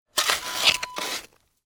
shovel hit dirt

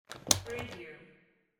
handbag lock

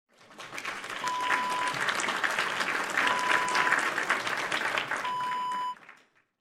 applause

